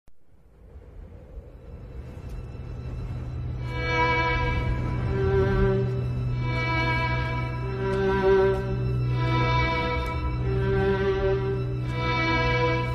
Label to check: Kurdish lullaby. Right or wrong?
Right